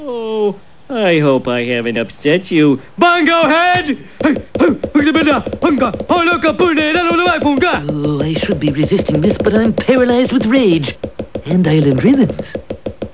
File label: bongo head; Simpsons